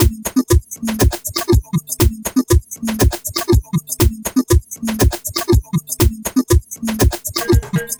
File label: African Hollow Beat, Composite Beats; Sonic Foundry (Sony Creative Software); Myths of Technology